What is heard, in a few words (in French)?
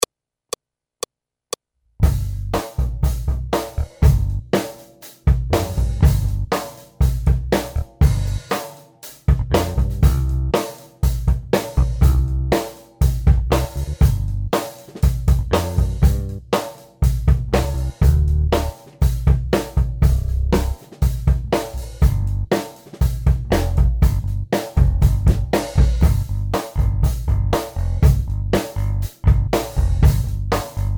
Rock Accompagnement seul, piano